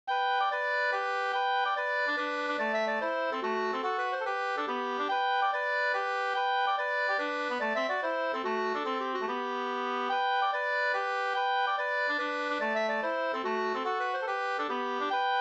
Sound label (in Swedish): mazurka, 2st